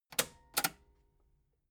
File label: metal button cassette stop slow